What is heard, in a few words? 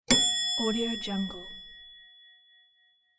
old ding bell; diogo-pereira